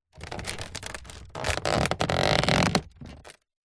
foley basket hamper picnic lid open or close movement creak; zapsplat